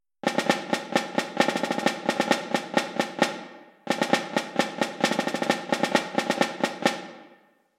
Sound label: percussion; Reicha